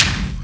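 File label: kick, funk kits; Crypton - DrumBase5000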